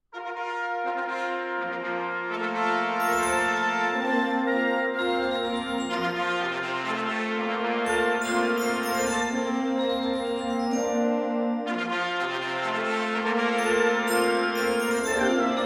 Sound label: ecstatic fanfare; Maj. Jason K. Fettig, conducting; Recorded by the Marine Band in August 2013; Northern Virginia Community College's Rachel M. Schlesinger Concert Hall and Arts Center in Alexandria, Va.